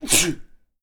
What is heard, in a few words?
small sneeze; افکت صوتی